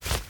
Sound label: Pigeons; rabbids multiverse; iOS, macOS, Mobile